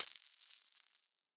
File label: money drop